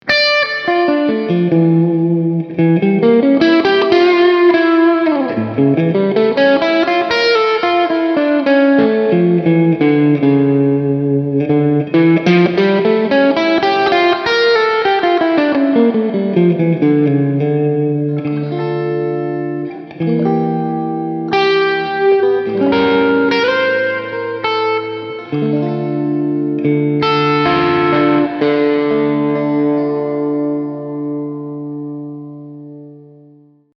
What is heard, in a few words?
ibanez tm1803m talman prestige dirty ballad bridge and middle full